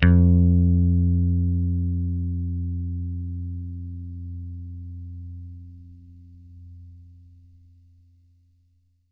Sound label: PICK F2, Bass; Good 80 Pick; Bass Mace; DirectWave